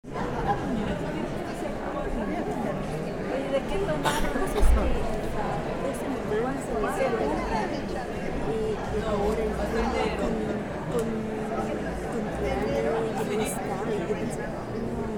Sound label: audience